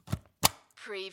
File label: rubber stamp once